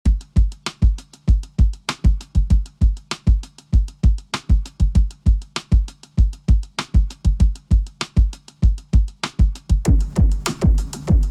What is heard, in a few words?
drums; phat vst by aldenbraverman